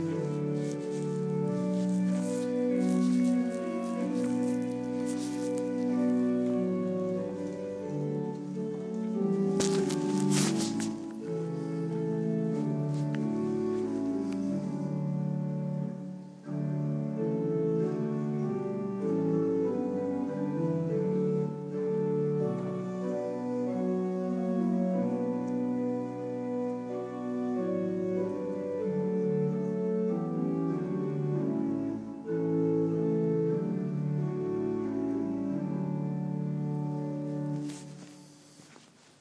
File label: church organ at hexham abbey northern england